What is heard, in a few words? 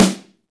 snare, Roland; Club-50 - Foundations Roland; Ext.Snare 1